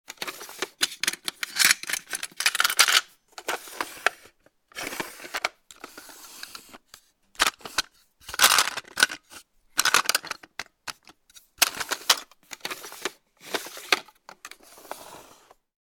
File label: Plastic Screw Drawers, Opening and closing DIY plastic drawers holding screws, nuts and bolts; Opening and closing DIY plastic drawers holding screws, nuts and bolts etc.